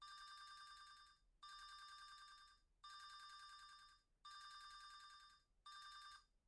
phone; IEEE AASP

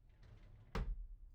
drawer; IEEE AASP

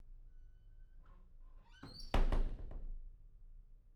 door slam; IEEE AASP

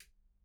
switch; IEEE AASP

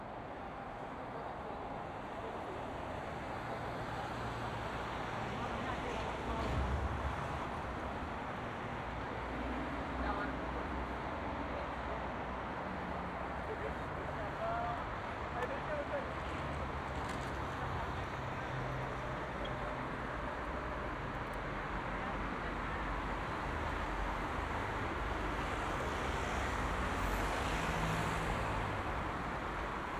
busy street; IEEE AASP; scenes

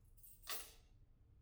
keys; IEEE AASP

